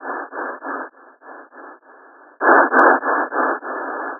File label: loud